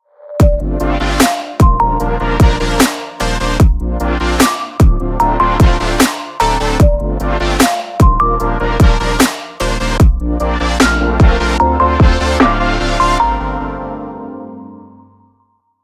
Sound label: funk logo; sound sculptors